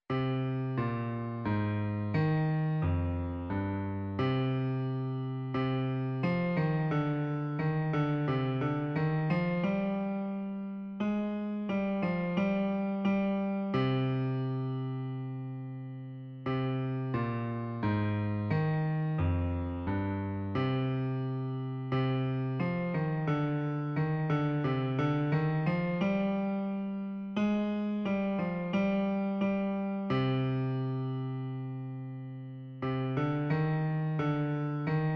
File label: Bass